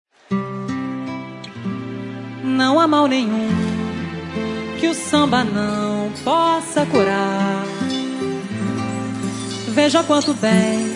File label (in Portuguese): Samba, Ao Vivo